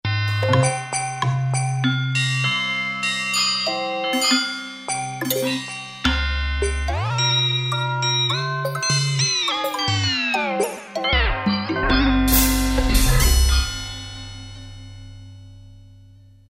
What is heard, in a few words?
Metal Garden, Kurzweil K2000; Kurzweil K2000 Orchestral ROM